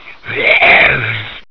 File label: attack; custom monsters